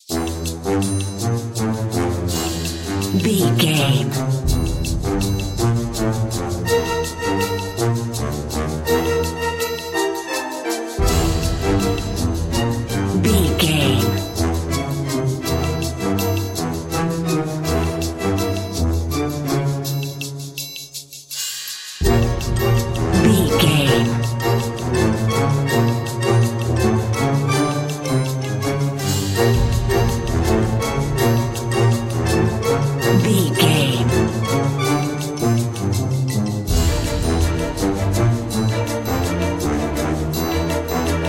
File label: undetected spy narrative